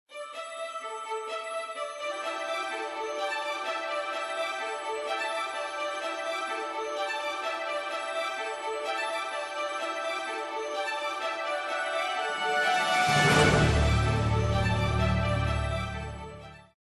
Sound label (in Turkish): santral fon muzikleri